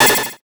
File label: Digital; Adobe; Multimedia Internet CD-Rom Flash